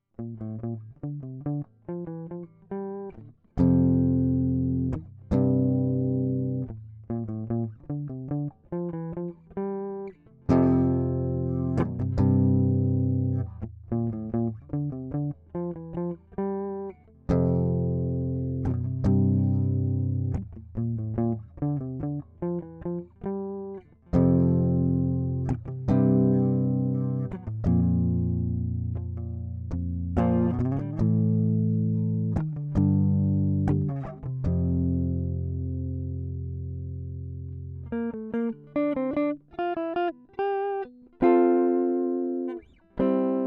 Riff

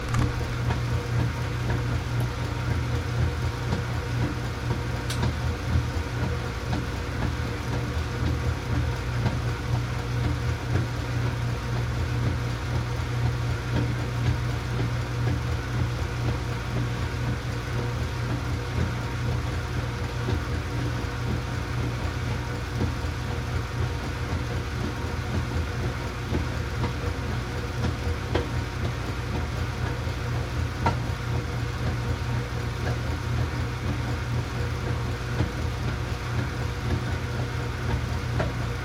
Washing machine bass line; Mr Yesterday

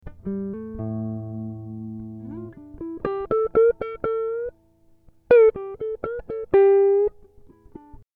Am60 GTR impro

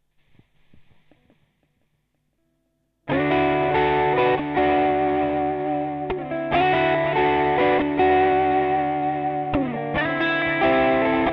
D140 GT Riff Trash

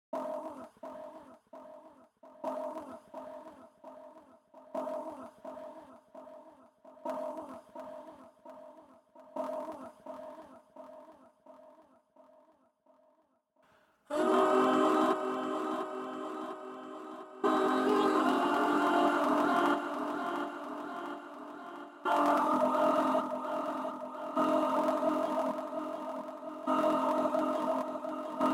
ninety nine percent noise

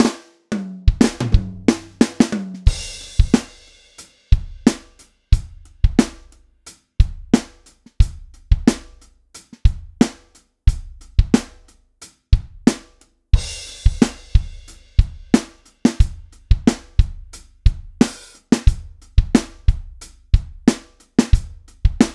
Funky Rave

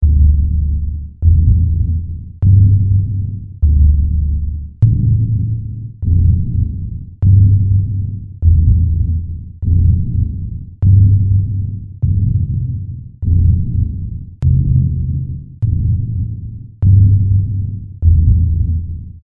Loops

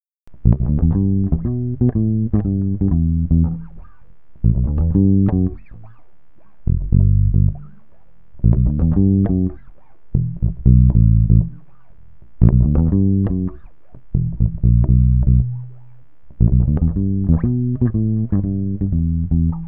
Em120 Jazzy GTR chords

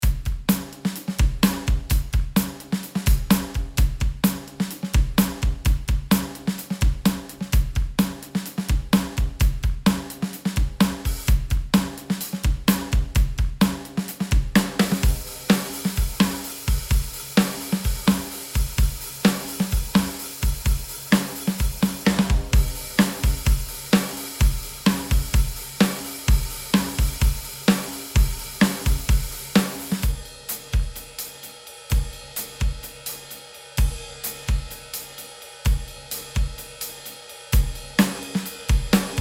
Funk-Rock Drum Loops 128BPM; pieropeluche

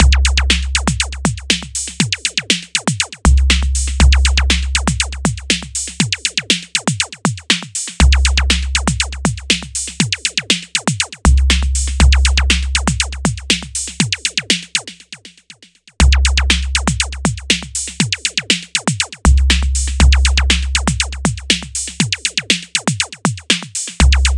Electronic

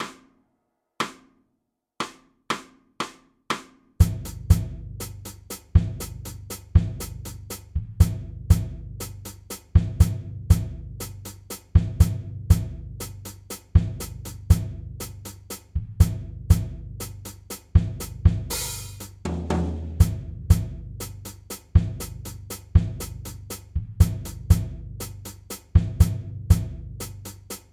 Drums 120 BPM

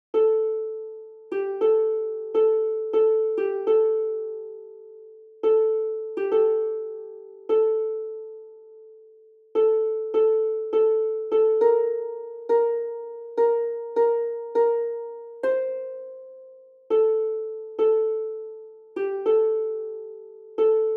Harp

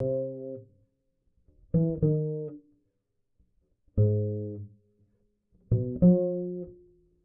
falling bass verse acoustic 4 times

